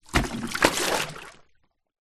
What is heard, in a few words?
industrial plastic bucket empty land in deep water; zapsplat